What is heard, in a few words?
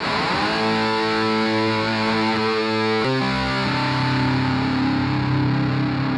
riff